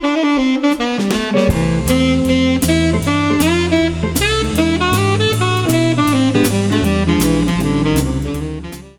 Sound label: jazz button